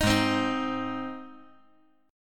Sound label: Ab Major Ab; strummed; JGuitar